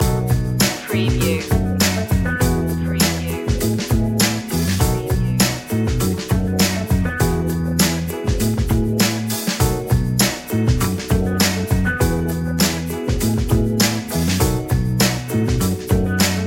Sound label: smooth deal loop